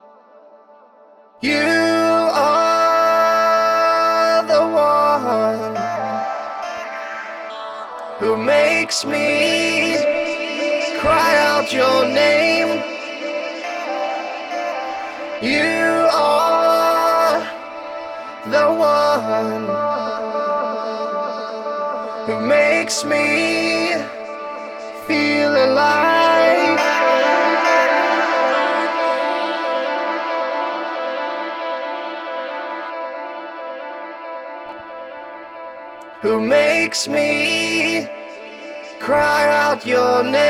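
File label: vocals loop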